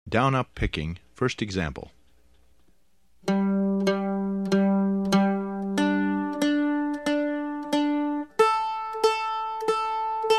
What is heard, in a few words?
Mandolin